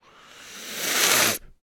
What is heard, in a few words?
snake hiss; sonniss gdc